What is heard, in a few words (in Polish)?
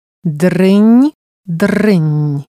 dryn dryn